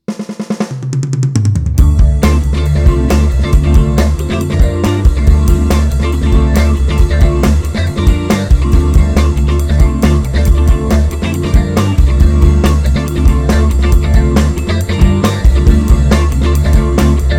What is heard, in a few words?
jazzy